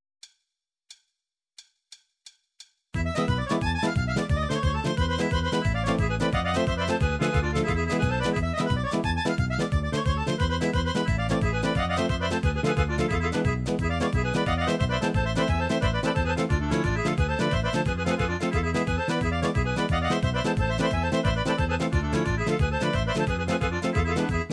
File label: folk bulgaria hora pravo horo, midi